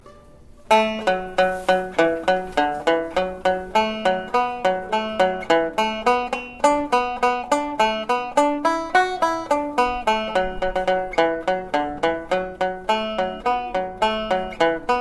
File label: jackie coleman's, banjo slow